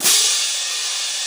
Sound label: ORCH CRASH; Crypton - DrumBase5000